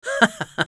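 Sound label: laugh short, Spanish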